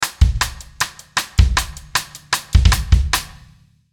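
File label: Musical Element, Drum Snare March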